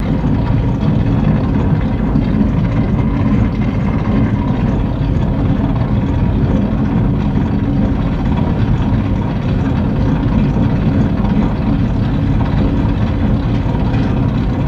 Diesel Engine Throat

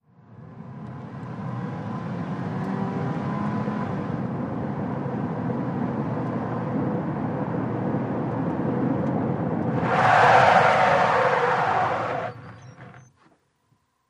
Sm Car Accl Skid, compact

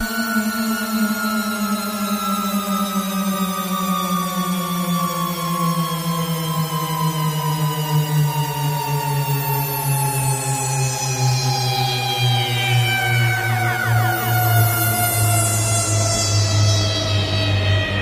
Starship Decel High

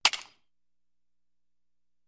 Baseball Bat Hits Alu; baseball fx & games

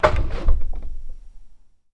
Apartment Door Clos

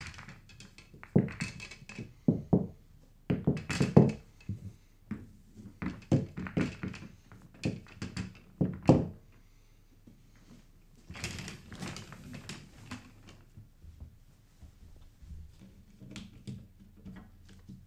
Billiards Game Sound

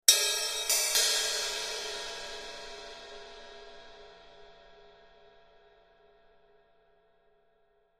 Cymbal Accent Hit, drums cymbal

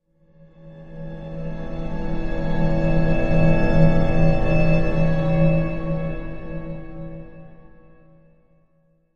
Music Eerie Slow, orchestral

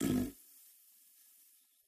Bowl Fart Soft Dry

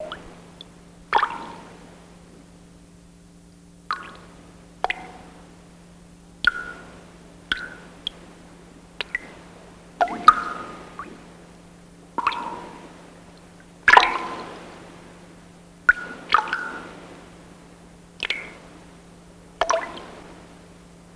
Water Drips Peaceful, drips

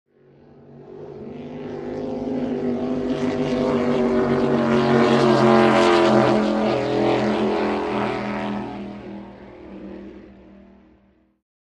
Power Boat By Fast, off shore power boat

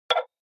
Button Hollow Wood Ta

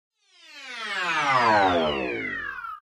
Descend Electronic Descend with Delay

